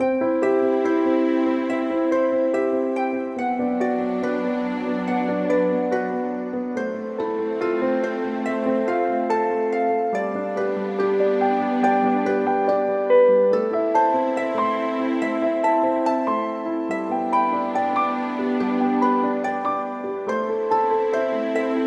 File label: Miracle Fantasy